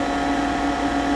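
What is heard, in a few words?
computer loop; Tony Hawk's Project 8; XBOX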